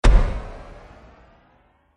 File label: Trailer Hit Flying High; Trailer Sound Effects